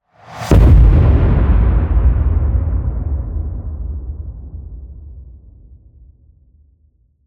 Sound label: HIT Fire, Cinematic Hits Designed